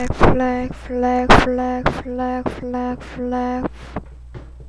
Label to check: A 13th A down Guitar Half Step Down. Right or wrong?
Wrong